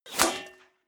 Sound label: bullet small impact metal; krieg-waffen; geschoss